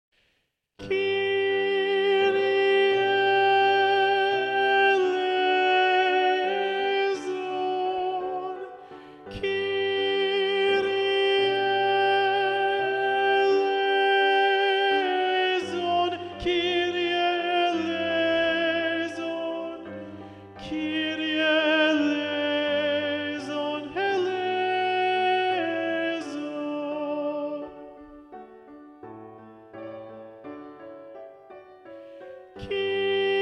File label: Alto; Mass No2 in G Mvt 1 Kyrie; Practice Room